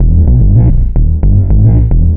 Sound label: Bassline; Drums & Drones